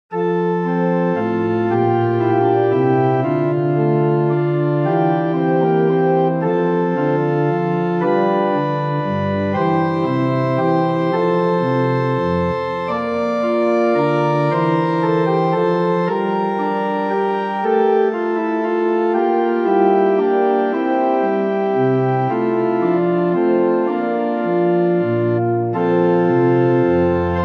Short Organ Music; hymn

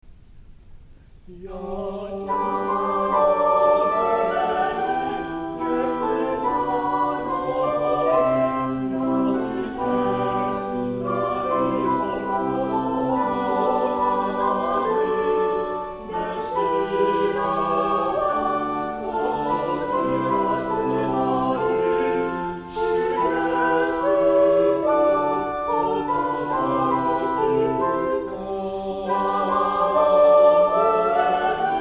Choir; K Shalom Choir; Jesus climbs the mountain; イェス山に登る; 千葉県教会音楽祭北ブロック 2017/9/9 船橋市民文化ホール

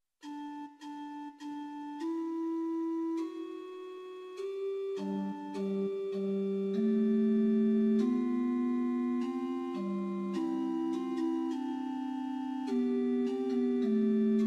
Postlude, Rohr F8

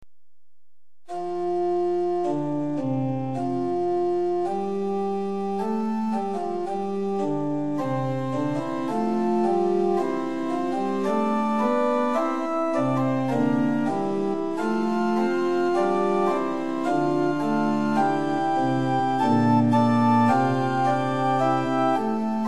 Organ Temperament Meantone; Prinz 8 4; hymn

